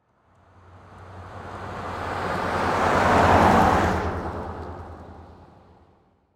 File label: Transportation Car Drive By